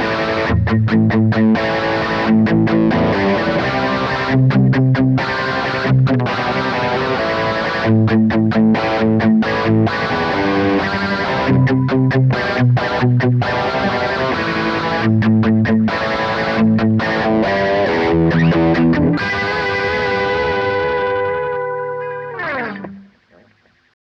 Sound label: Artemis Light Wobble w Crunch; Spaceman